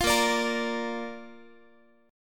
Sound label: A sharp Major, Guitar; strummed; tuning 4A,4E,5A,5D,5G#,6C#